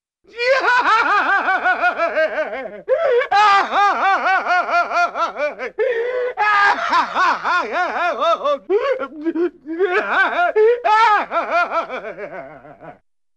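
Funny Man Laughs with a Sobbing-like Quality, Cartoon; Hollywood Edge; Cartoon Trax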